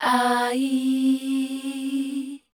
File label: MEL C R, Voice Spectral; Best Service; Voice Spectral II